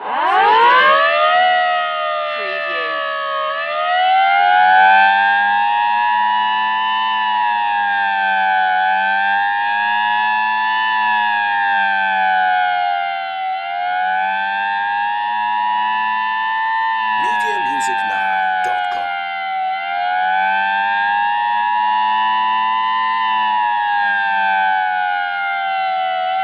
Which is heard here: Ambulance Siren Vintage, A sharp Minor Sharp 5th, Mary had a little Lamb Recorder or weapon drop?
Ambulance Siren Vintage